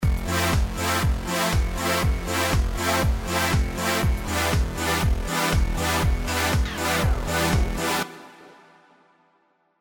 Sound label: side chain